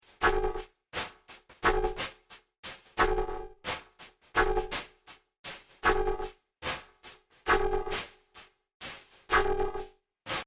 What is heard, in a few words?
square beat